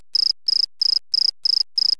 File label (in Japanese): futahoshikoorogi, insects